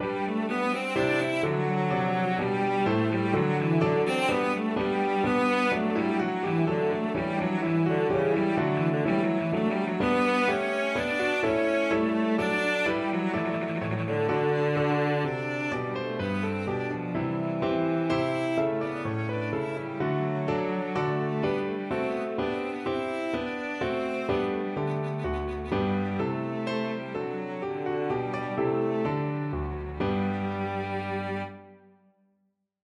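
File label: violoncello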